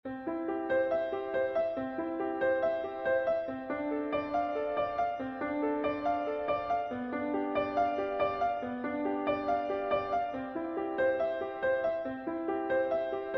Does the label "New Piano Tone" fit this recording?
Yes